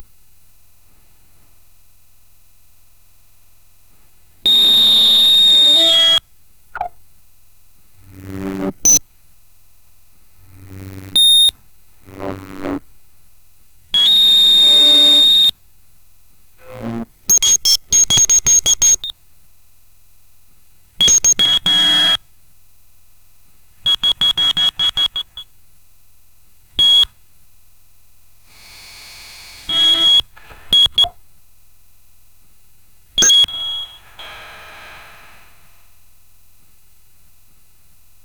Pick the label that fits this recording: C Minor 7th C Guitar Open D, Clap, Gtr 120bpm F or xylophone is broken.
xylophone is broken